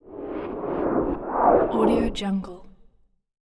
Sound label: time stop